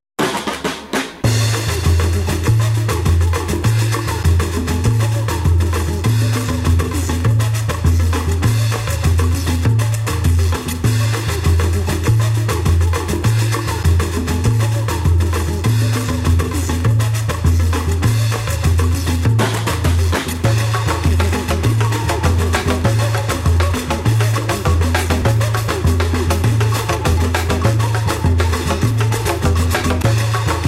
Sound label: samba